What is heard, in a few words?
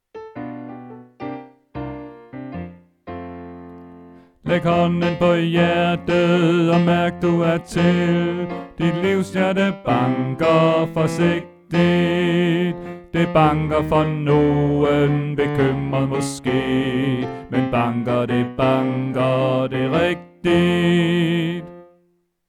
Bas; oevefiler